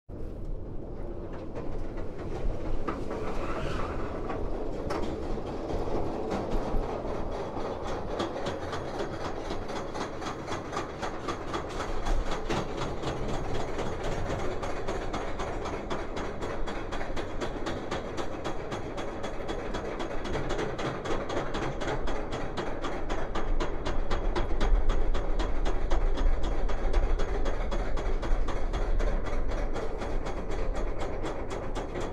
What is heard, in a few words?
Roller Coaster